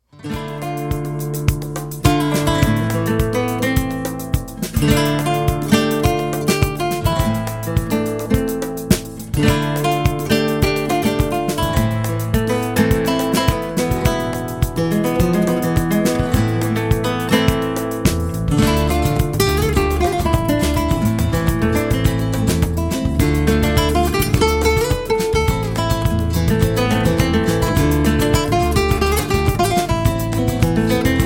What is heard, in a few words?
Flamenco Guitarist; Atlanta